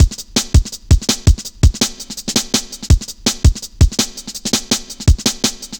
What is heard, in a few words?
Virtual, Drum Loops, 165.5, Jungle; Zero-G Jungle Warfare 1, 2 & 3